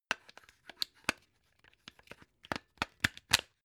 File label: plug plug in clatter long